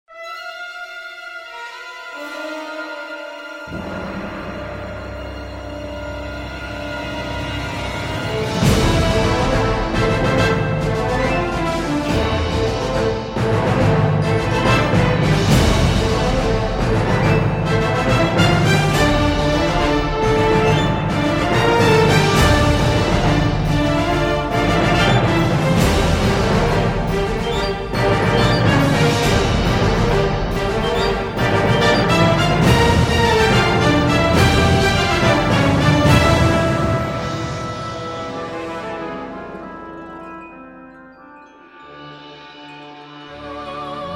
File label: pirate chase